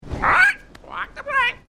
Parrot

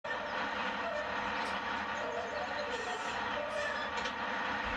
Squeaky Pipe

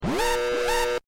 Alarm Reactor; FF7

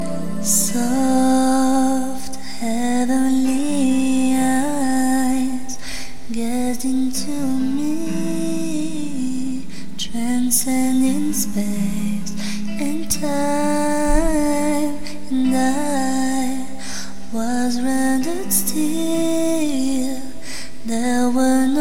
Beautiful Voice

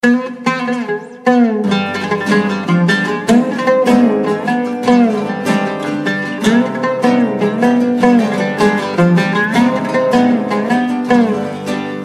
Guitar and Lute

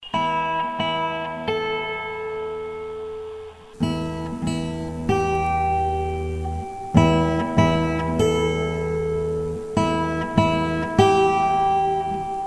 Suspense

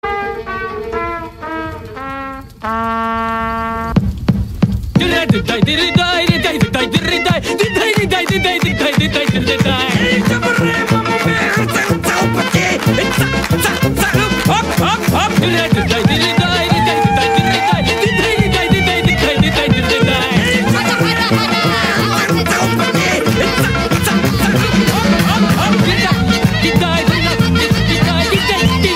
Klezmer

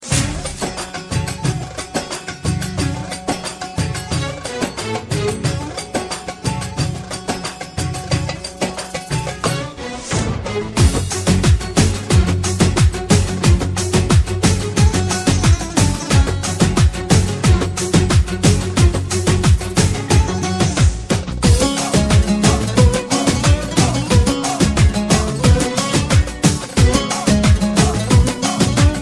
Turkish Theme Music

